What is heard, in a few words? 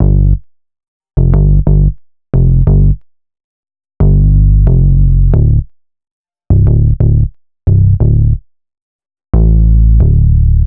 HipHop Synthbass Gmaj, Hip Hop Bass Synthbass loop; Digidesign; Pro Tools Essential Loops